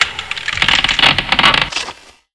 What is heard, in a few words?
ice break